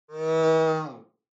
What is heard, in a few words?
Cow Synthetic